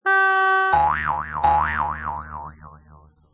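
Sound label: Circus Room Bike Horn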